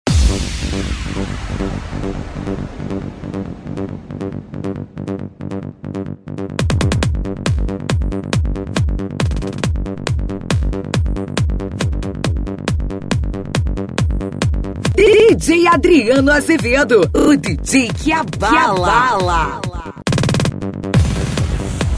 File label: gospel dance